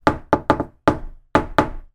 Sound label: household door knocks pattern rhythmic; zapsplat